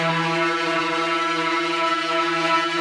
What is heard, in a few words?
Cigar Fuzz FX 170 E, guitar drone samples 170bpm